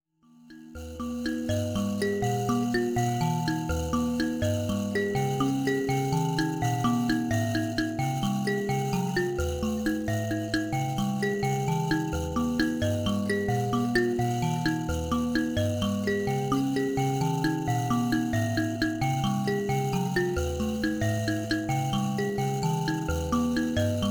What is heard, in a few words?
mbira